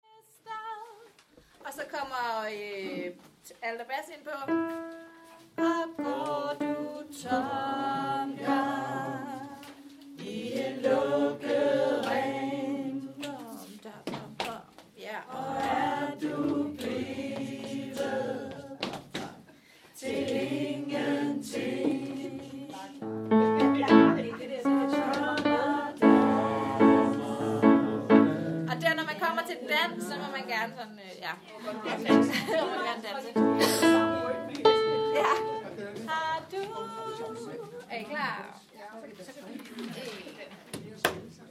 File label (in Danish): Sirenesangen oevelser